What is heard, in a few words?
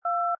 DTMF 1